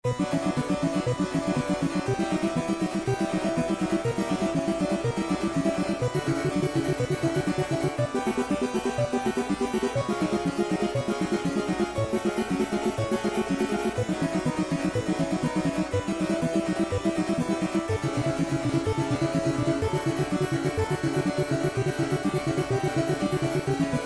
Sync Sine Soni

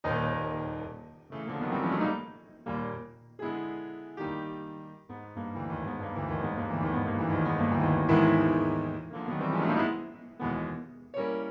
Sync Wavetable Soni

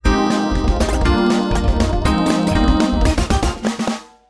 Pop; TSMtoolbox

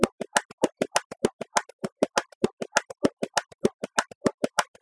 Bongo

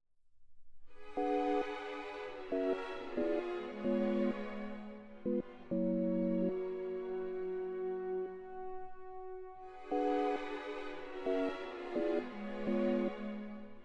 Sync Sine Soni

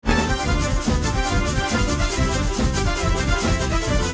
Jazz; TSMtoolbox

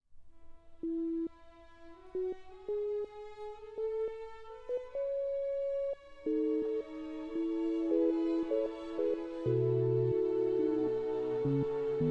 Sync Sine Soni

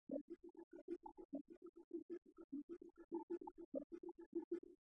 Bongo

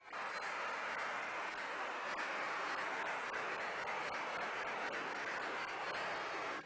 Castanets Violin Applause

